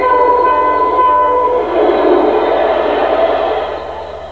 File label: turbo, train sounds; mdamtrak199